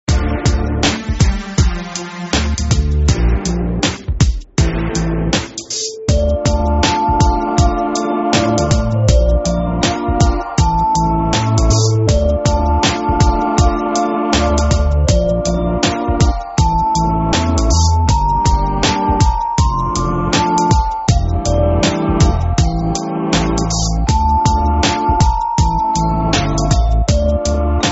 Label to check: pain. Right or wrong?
Wrong